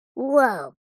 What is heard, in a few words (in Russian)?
zvuk vau multyashnyi